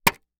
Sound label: Sports Baseball Hitting Catchers Mask